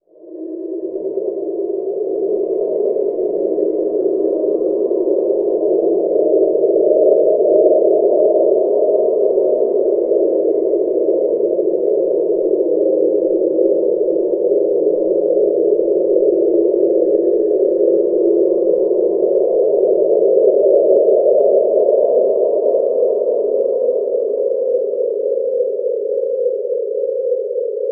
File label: Atmo FX; Sonic Foundry (Sony Creative Software); Leo Cavallo Bass Taster; Special Fx